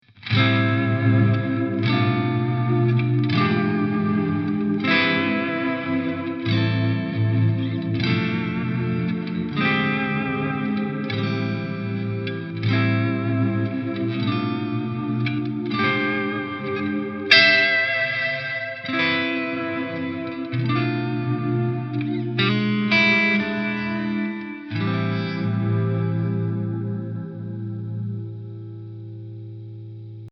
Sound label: DRONEBOT; MOD Devices MOD Duo